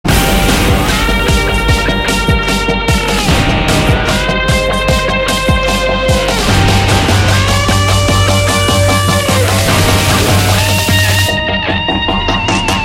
Guitar Bgm

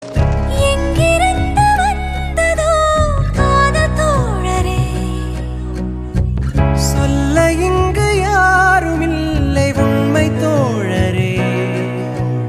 Love Ringtone

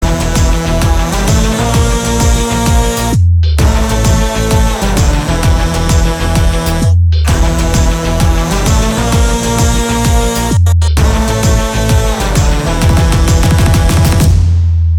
Mass Bgm

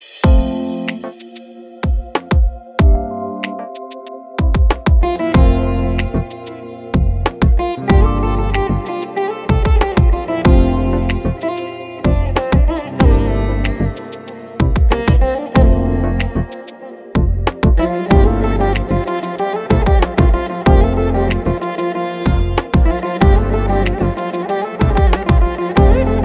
Insrumental; Mohanlal

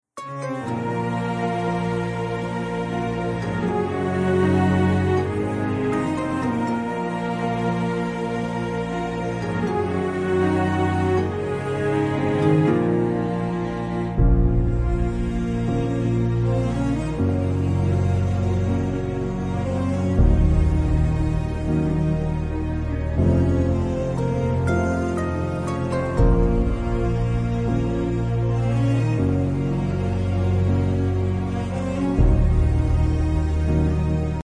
Karaoke Ringtone

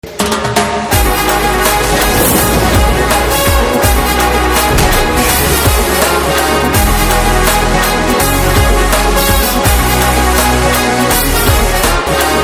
Birthday Blaster Theme Ringtone; Sarkaru Vaari Paata

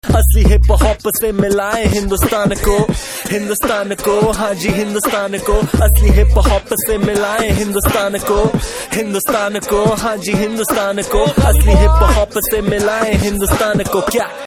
Hip Hop; Gully Boy

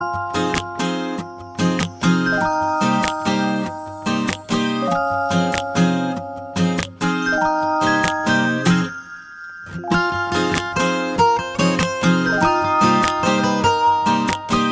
Instrumental Tone

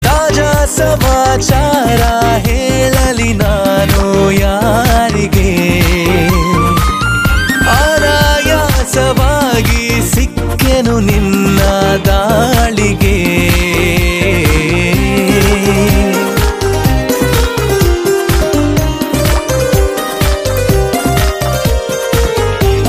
Male Melody

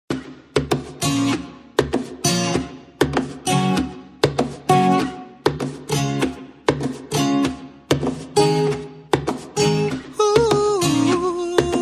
Starting Musik Ringtone

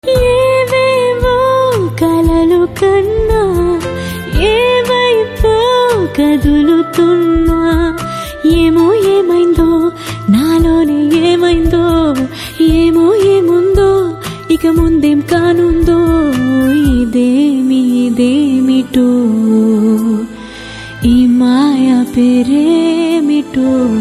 female version ringtone

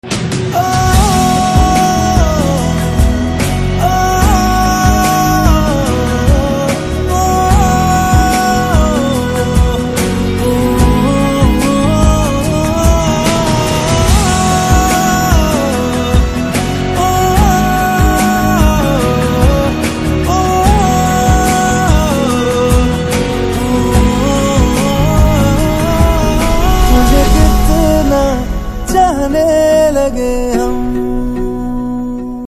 BGM Humming Ringtone

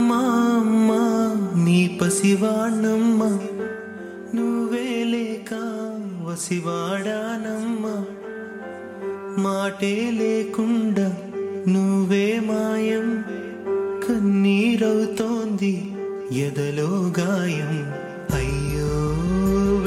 Song Music Bit

